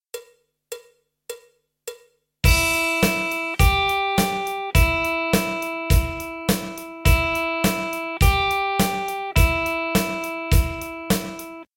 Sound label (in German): Blues-Rock Lick, E-Gitarren